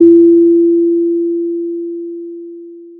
pop, Sound of a doorbell

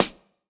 Snr, drum

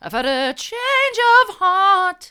i've had a change of heart mid, vocal

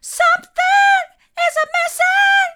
something is missing hard, vocal

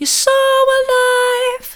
soft, vocal

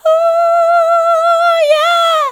ooh yeah soft, vocal

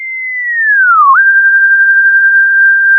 Sound of a police car siren

